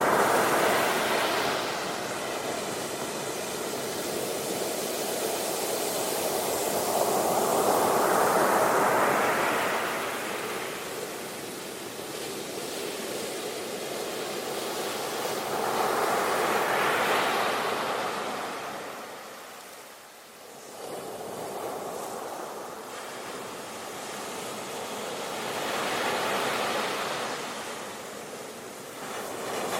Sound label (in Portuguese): Som do Mar Ocean Sound; 20 Minutos Para Meditar